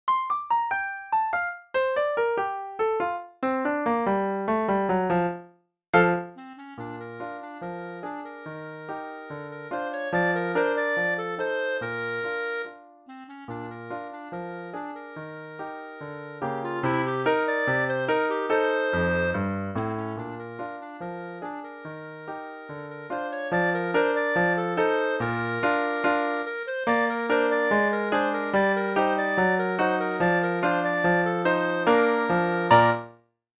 clarinet solo; the entertainer

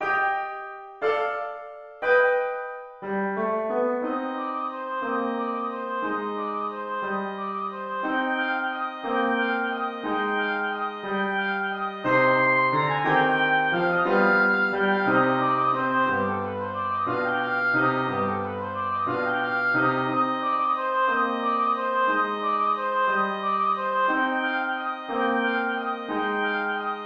oboe

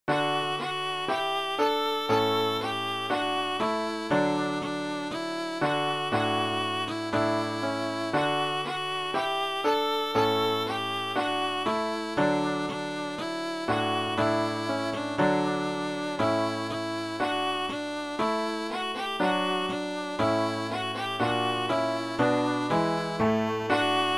violin

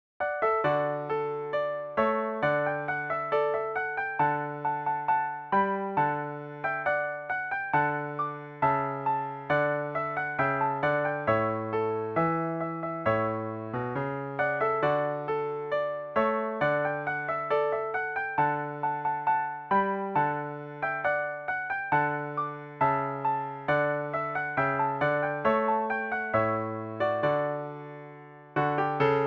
piano

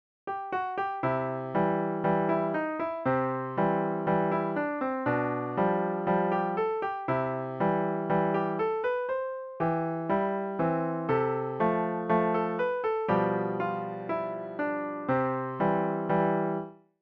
piano

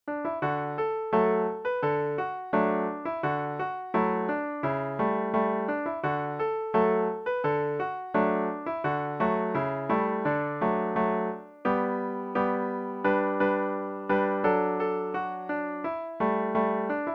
piano

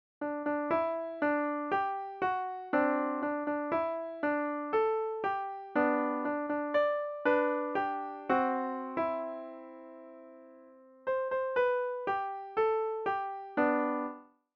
easy piano; happy birthday

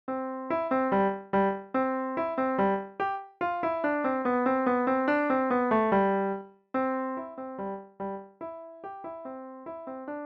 sonatina

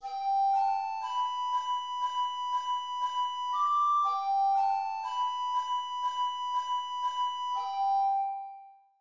my horsy recorder

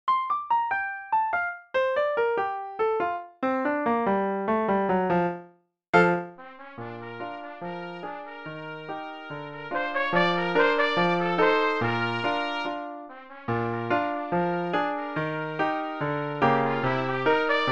the entertainer trumpet solo

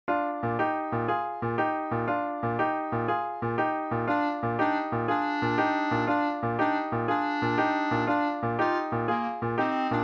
boogie clarinet solo; jingle

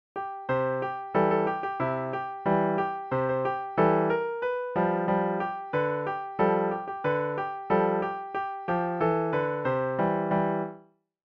piano

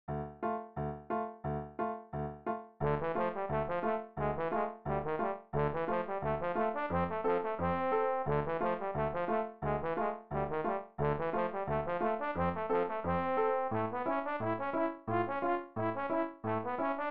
trombone solo; in the hall of the mountain king

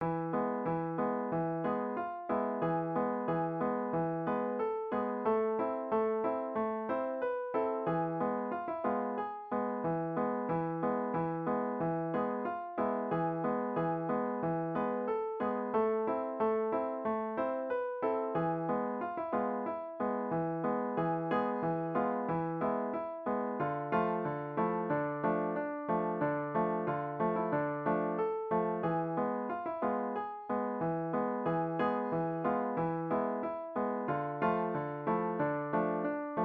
hava nagila piano

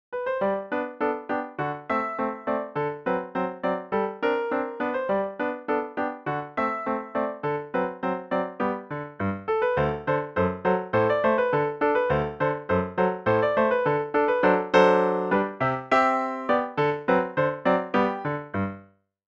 piano solo

